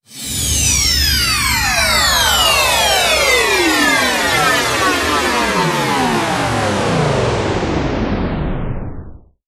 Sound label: FX Sweep Down; Vengeance Samples